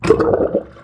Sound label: PLUG; EARTH